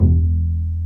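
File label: STR Cb Pizzicato, strings; Cb Pizz 3; Roland L-CD702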